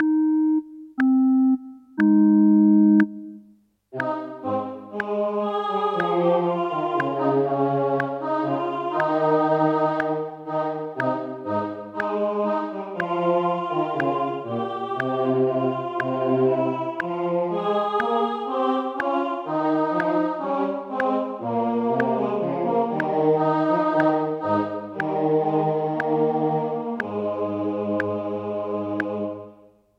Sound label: O clap your hands; Gibbons